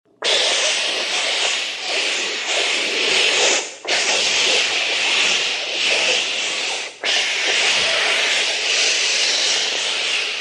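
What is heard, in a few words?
extinguishing fire with foam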